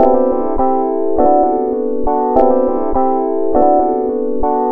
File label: Happy Lick; Sonic Foundry (Sony Creative Software) - Rondo Brothers Trip-Hop Loops & Samples; Liberation